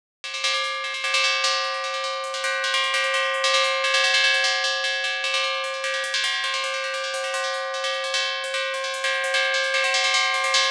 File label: mesh 2D from Strike Train